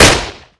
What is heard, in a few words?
sten Shoot; Minecraft; Infamy; Last Alive Pack